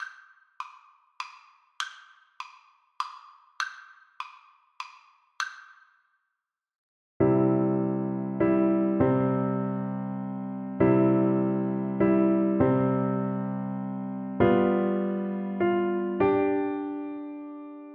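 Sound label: recorder karaoke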